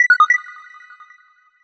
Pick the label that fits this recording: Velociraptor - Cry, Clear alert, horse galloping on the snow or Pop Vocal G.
Clear alert